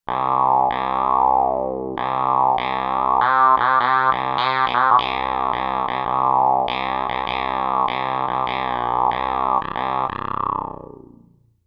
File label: Jaw Harp, synth